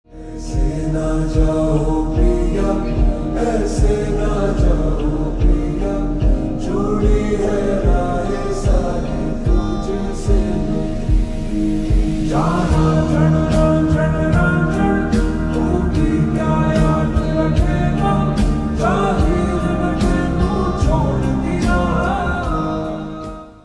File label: Slow Reverb Version Ringtone; do patti